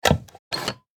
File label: Office hole punch paper vintage